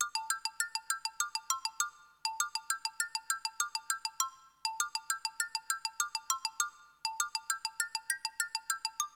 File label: Xylophone; Ultima VII The black gate and The Serpent Isle